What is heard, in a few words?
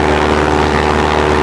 fan blade full throttle loop, airboat; XDM; vehicles